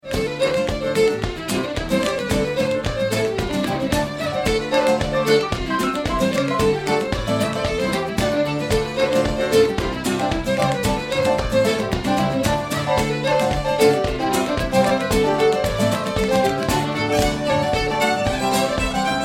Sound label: Reels; Celtic Colours Reels